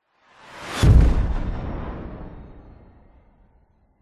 sound design cinematic short fast whoosh into a dull punchy explosion; zapsplat